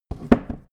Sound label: wood handle